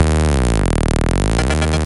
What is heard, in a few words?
Music Dance Intro; Littlest Pet Shop City Friends Beach Friends Country Friends